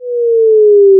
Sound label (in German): ton; akustik; physik